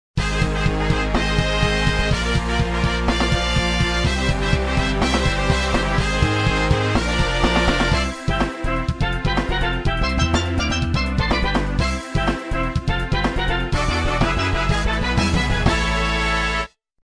Trophy Celebration

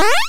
Door Open; SMM; SMB

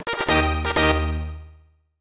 2-Player Mode Win

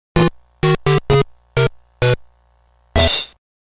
The "Game Over 1" theme

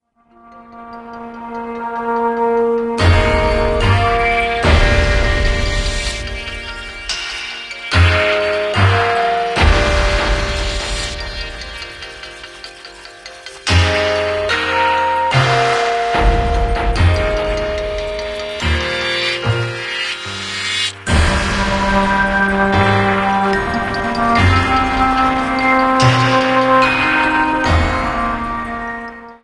Soft Light

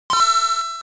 Coin+

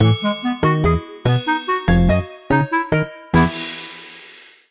Win; Winding Ravine

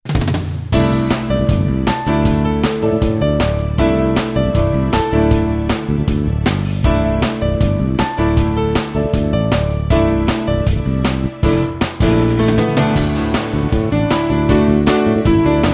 Music Staff Roll; MKDD

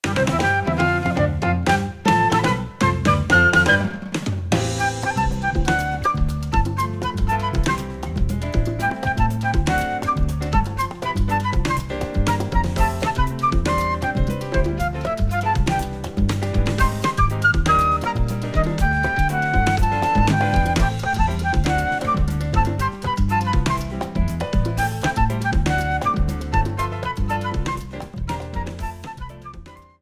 Title Screen (Latin Jazz Ver.) Arrangement; SMK

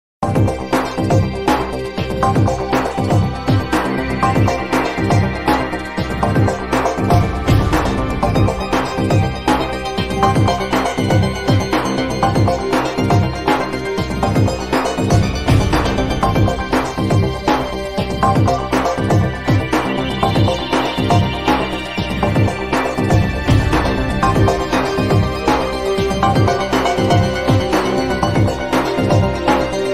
Airship; SMM; SMW

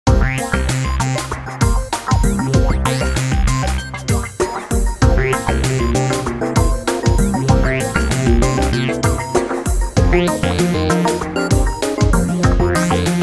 Underground; SMM2; NSMBU

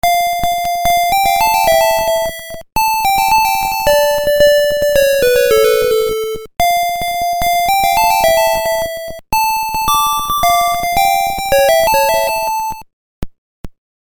Game Over theme; DKL3

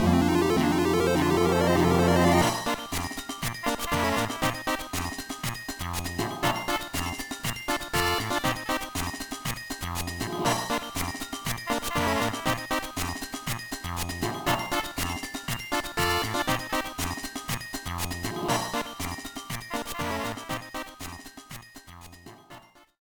Mini-Game Attack - Attack Completed

